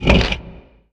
gacha mouth

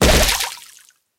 hit

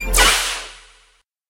basic

